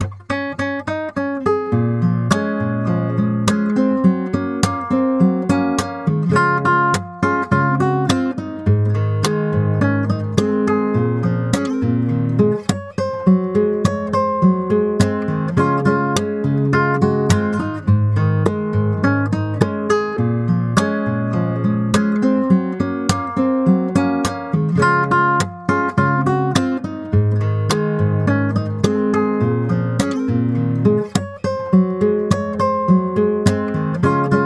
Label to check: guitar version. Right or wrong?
Right